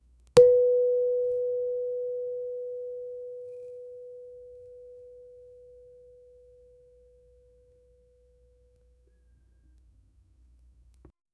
a high pitched boing sound of a spring being released, cartoon